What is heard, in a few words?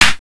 slap; ambience